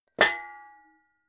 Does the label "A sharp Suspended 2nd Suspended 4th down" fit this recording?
No